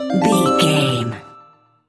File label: jingle chime positive